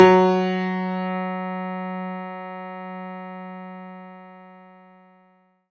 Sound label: F#3, synth; 90 s Sample CDs; NiceBeats; SynthLine VOL-23